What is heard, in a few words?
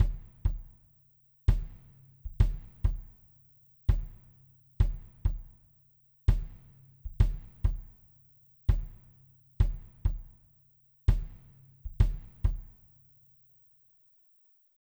Wave Drum KD